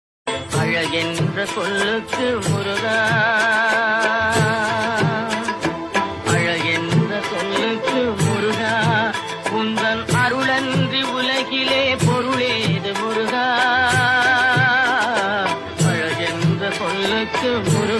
Old Murugan Ringtone

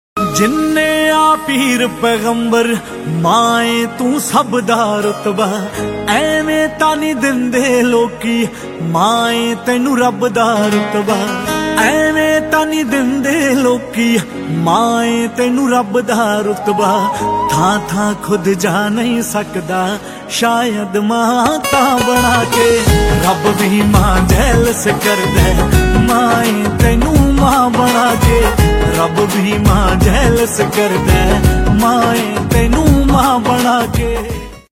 ringtone punjabi song

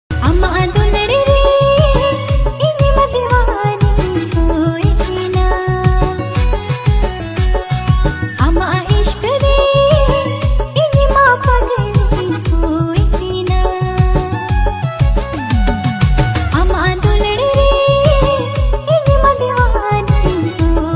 new santhali ringtone

